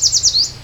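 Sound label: song yellow warbler maine end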